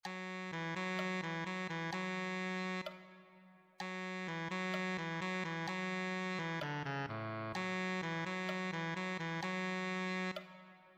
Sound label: tenor saxophone